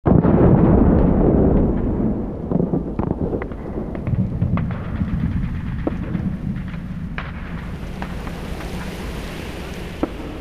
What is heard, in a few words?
Amb Town Ext